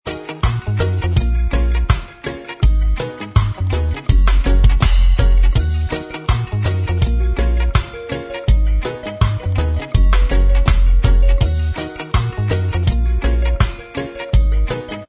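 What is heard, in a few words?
instrumental, backing track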